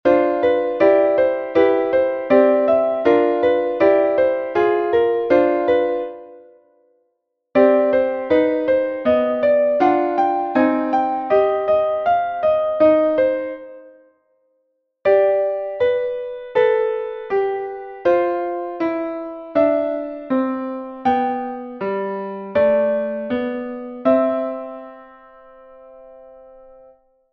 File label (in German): wechselnote; italienisch: Note cambiata, französisch: Notes de passage; dommer 1865